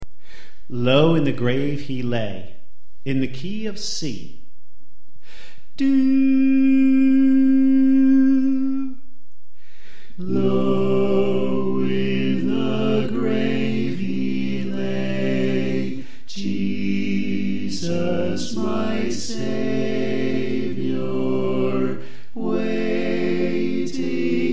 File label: Tenor Left